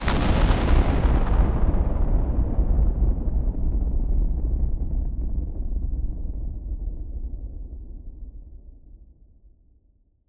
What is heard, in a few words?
thunder crash synth